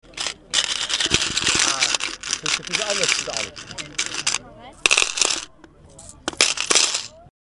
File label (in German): geldkasse